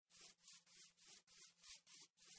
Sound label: minecraft grass walking sound effect